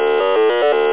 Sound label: Eat Muncher; Super Munchers